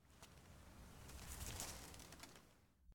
tumbleweed rolling; weather and nature

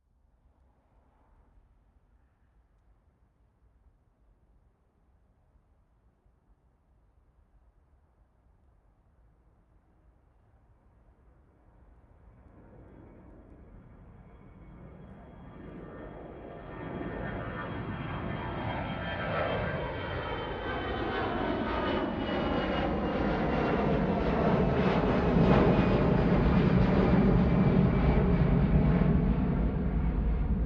planes takeoff boom; sonniss gdc

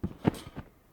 trampoline realistic

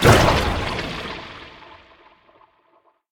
magic spells impact water low; fvtt sonniss gdc audio

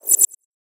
future swipe; fvtt sonniss gdc audio

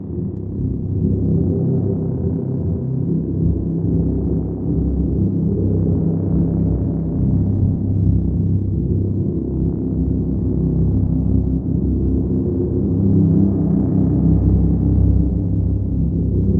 mothership; sonniss gdc

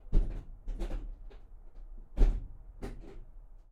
blenheim mk i hatch loose cockpit; mkh8020; sonniss gdc

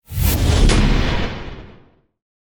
magic missile, spells; 1st level spells

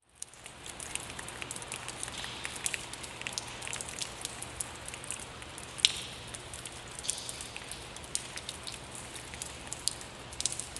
amb ext rain dripping in parking garage; fvtt sonniss gdc audio

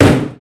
impact

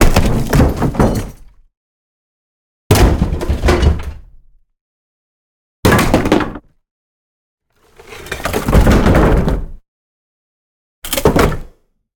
broken beam wood ceiling drop on concrete; sonniss gdc

